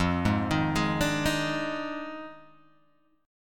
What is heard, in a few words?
F Minor 13th, Guitar Open G